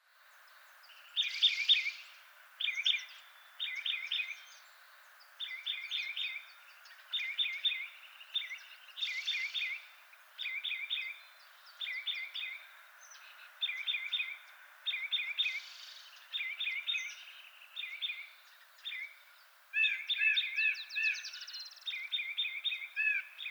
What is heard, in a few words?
Oak Titmouse Baeolophus inornatus